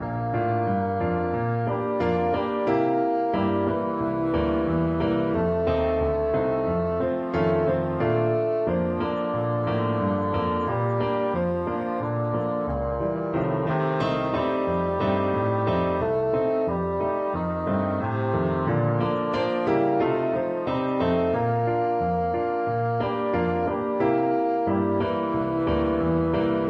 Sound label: bassoon